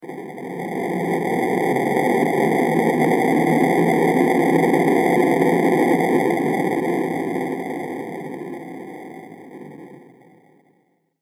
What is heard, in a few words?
Explosion Gritty And Bitty; Bomb Sound Effects; Impact / Explosion Sound Effect